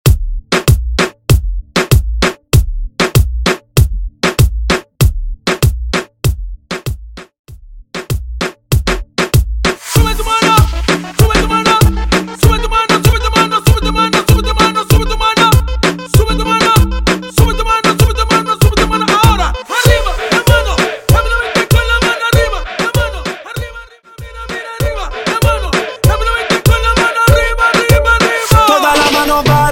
Intro Hype Outro 97 BPM

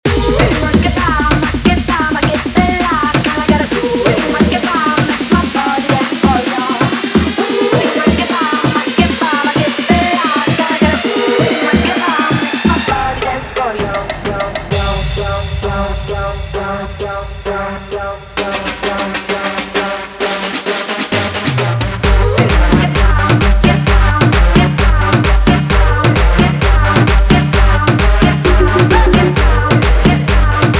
guaracha Remix 131 bpm

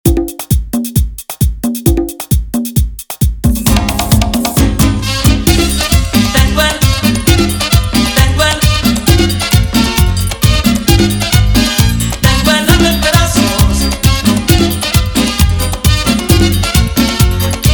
133 bpm

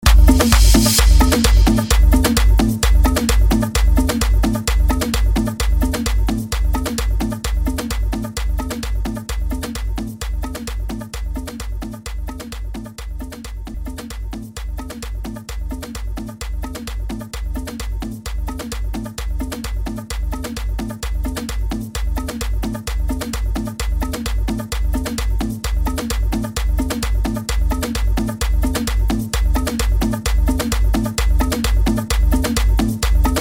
130 bpm

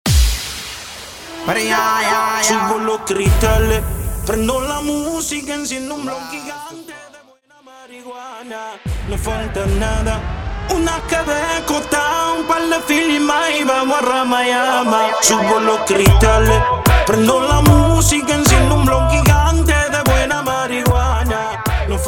Acapella Starter 150BPM

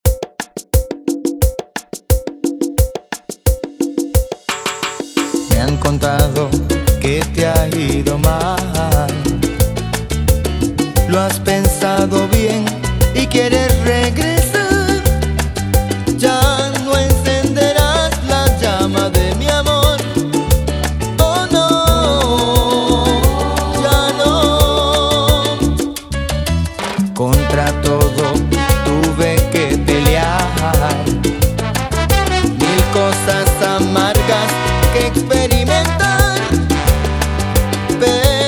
88bpm, Intro Outro

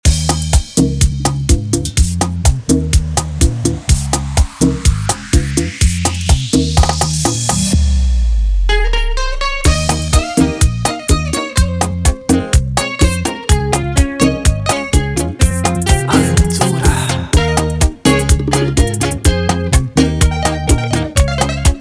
intro outro 125bpm

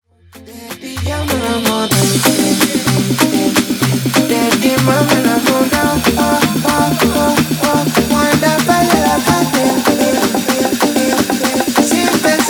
afro remix 126 bpm

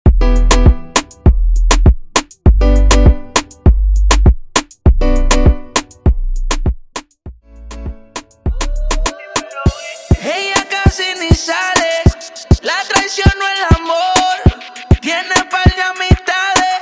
percapella intro outro 100 BPM

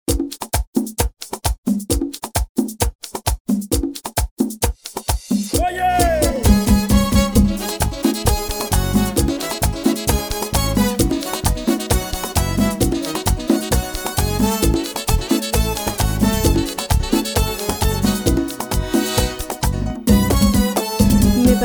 House Remix bpm 131

